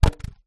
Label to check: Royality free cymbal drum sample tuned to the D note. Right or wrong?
Wrong